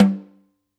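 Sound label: Snare Off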